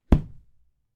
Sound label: Golf Club Hitting Bed